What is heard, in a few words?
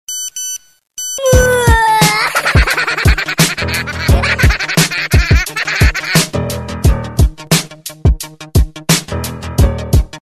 Crazy Nokia, Ringtones